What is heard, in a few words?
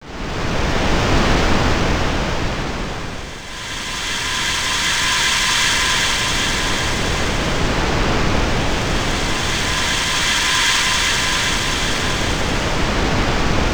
metal sea, synth waves; Zero-G Ambient 1